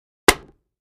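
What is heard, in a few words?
2x4 Smashed Sledge Hammer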